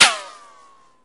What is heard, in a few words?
weapon default hit; STALKER